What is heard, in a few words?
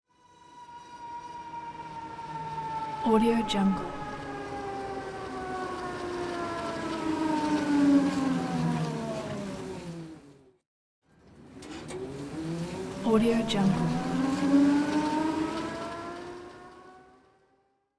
electric cart